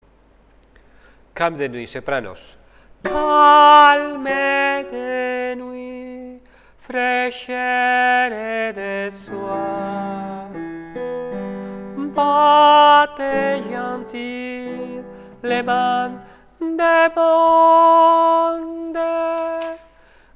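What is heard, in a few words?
soprano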